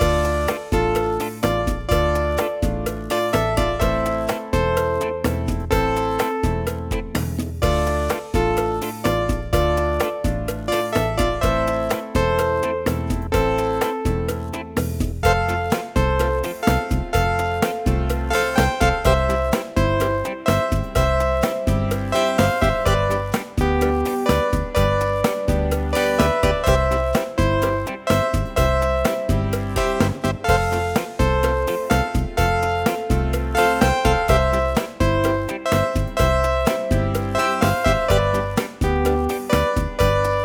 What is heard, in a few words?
8 Bit Antics, chiptunes music